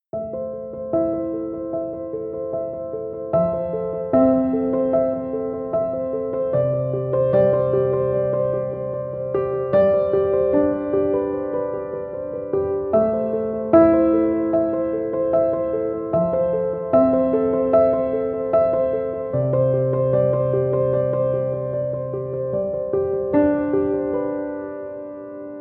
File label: Emotional Piano